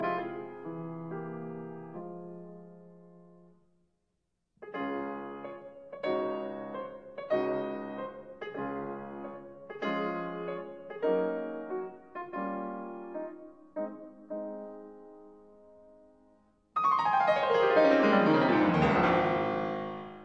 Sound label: Fantasia in G minor; Op 77